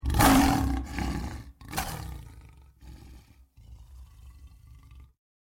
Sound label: Leopard; which has somehow been labelled as a lion